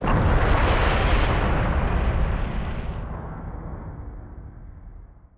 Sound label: Death Int